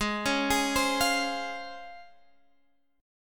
C sharp Major 7th

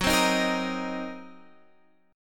Gb 6th Flat 5th Gb down Guitar Open F; strummed; Gb6b5; JGuitar

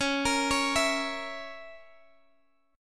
Db Minor 6th Db down Guitar Open G

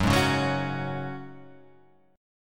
F sharp Suspended 2nd, F sharp, chord; strummed; x,x,4,1,1,1,3,3; down

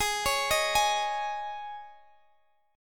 Ab Suspended 4th Ab, Guitar Open G; arpeggiated; guitar chord; x,x,18,18,16,18; Absus4

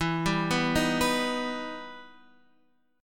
E Minor Major 7th E down Guitar Standard; EmM7 arpeggiated

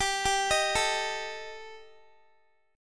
G Suspended 2nd Sharp 5th, Guitar; Gsus2#5; arpeggiated; x,22,19,20,21,x

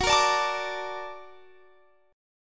Bb Augmented Major 7th Gb

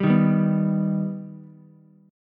B Augmented B Ukulele, chord; strummed; JGuitar

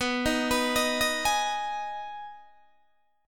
B 6th B down Guitar Open D

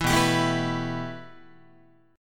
B 7th Suspended 4th Sharp 5th B down Guitar; B7sus4#5; strummed

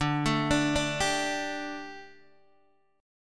D Suspended 4th D Guitar DADGAD

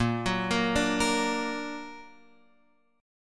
A sharp Augmented, Guitar Open F; A#+ arpeggiated; x,5,6,5,5,5; guitar chord

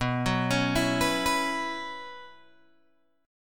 B Suspended 2nd Suspended 4th, Guitar, Open G

